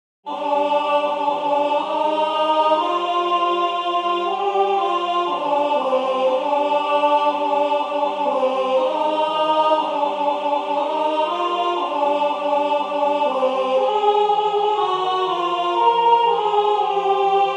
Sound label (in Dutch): Alt, koor; Wie der Hirsch schreiet